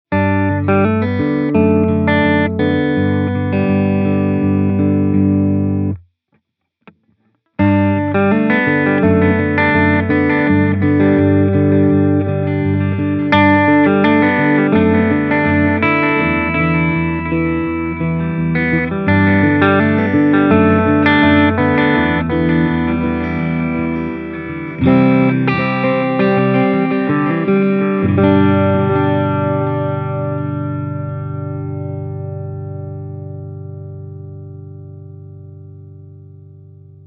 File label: Mod Panning Delay